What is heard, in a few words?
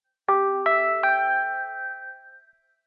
LG Lake, Ringtone